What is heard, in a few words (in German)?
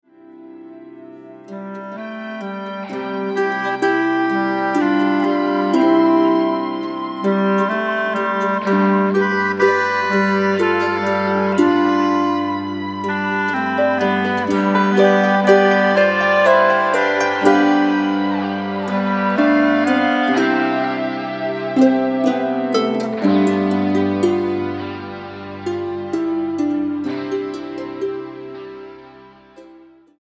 Landler Moderno